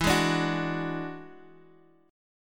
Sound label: Gb 7th Suspended 2nd Sharp 5th